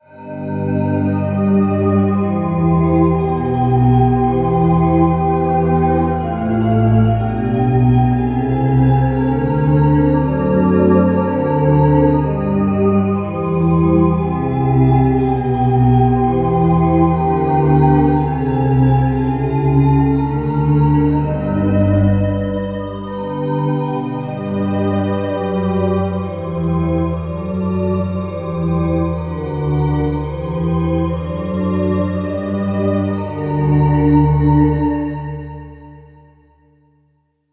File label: goblin